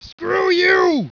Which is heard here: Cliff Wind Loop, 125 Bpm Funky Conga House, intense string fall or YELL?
YELL